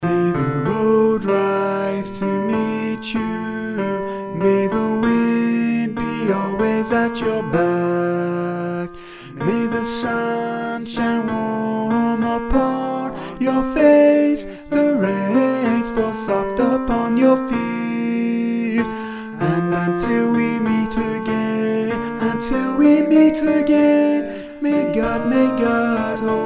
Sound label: An Irish Blessing - tenor; classical-traditional